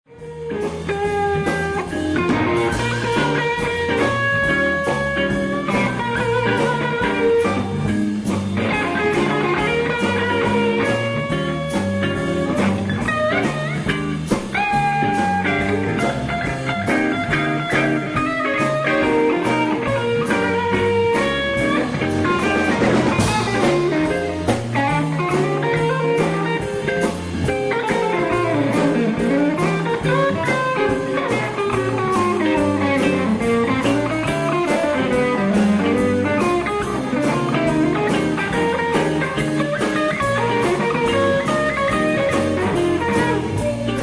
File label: blues shuffle solo